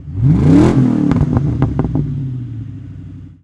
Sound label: Mustang Rev; Automobiles